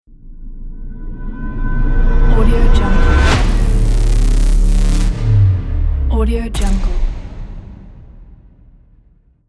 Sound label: horror cinematic swoosh opener logo